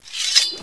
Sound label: draw, weapons; mastercombat; cstrike